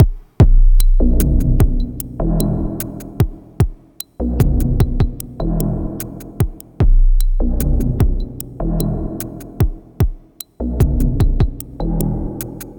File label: Darkwave, Processed Percussion